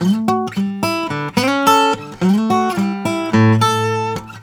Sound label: Blues Guitar; ILIO; Fretworks